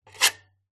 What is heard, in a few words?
foley metal scrape sharp object rusty old thin